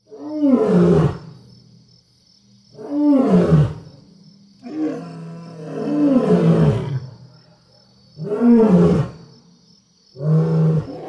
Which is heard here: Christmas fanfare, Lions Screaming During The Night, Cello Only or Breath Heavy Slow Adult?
Lions Screaming During The Night